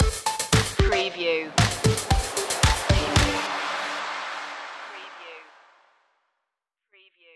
funhouse 84 stinger; street party

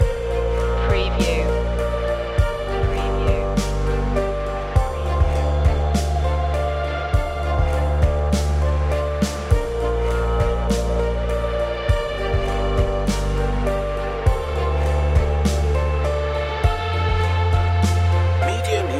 uplifting atmospheric loop; ethereal

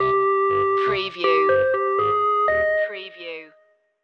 8-bit boss stinger; video gaming

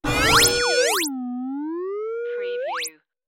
tonal transmission interference; electronics; radio; the sound pack tree

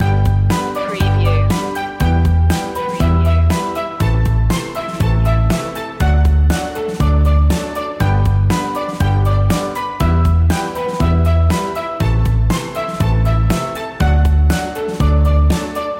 An uplifting, assured corporate piano piece; motivational; quietly confident

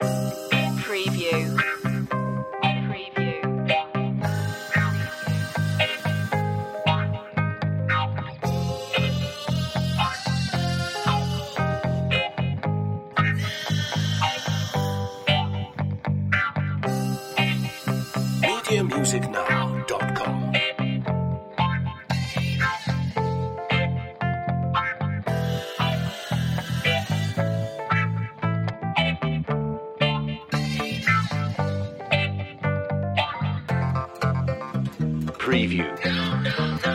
groovy vintage tune no drums, rhythm and blues

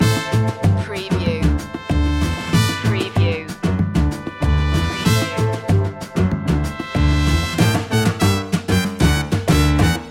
loop, latin spanish; world influences

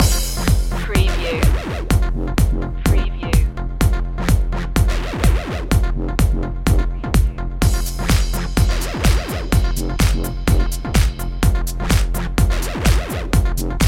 sexy step loop

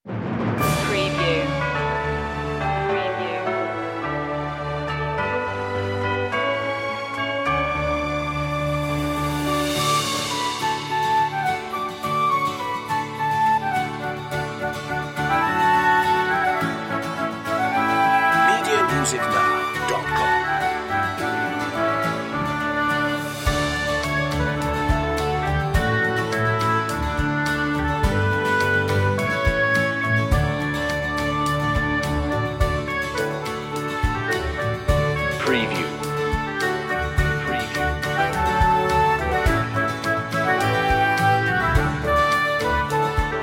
orchestral; joy to the world; woodwind

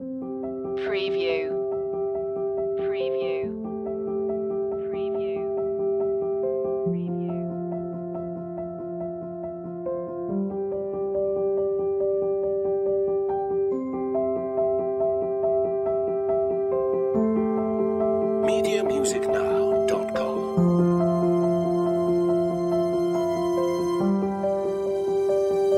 loop, wedding

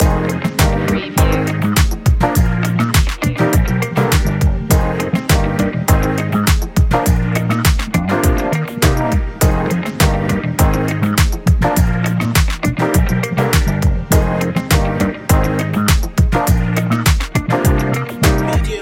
loop, urban, rnb, hip hop

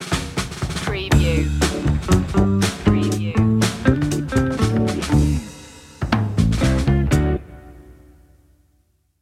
check it out intro, funky groovy

